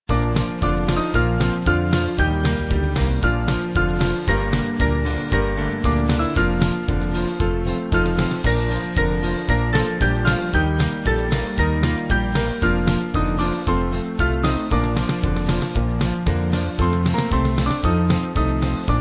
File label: Band, Music Backing Files for Churches